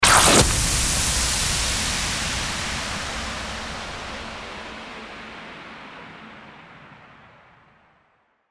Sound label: STARTER WHOOSH